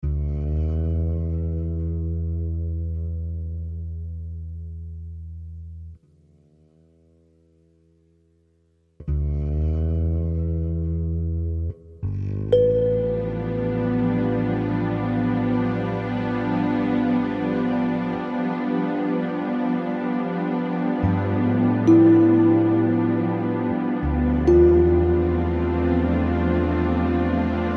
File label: Spa Music